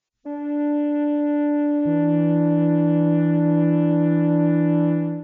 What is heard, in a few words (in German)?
flöte d2 e1; Intervalle, die man durch Potenzen von 2 und 3 darstellen kann